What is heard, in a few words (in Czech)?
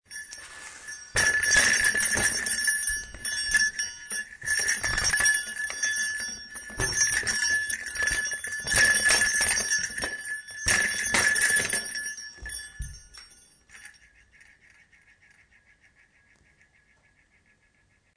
mechanicky mic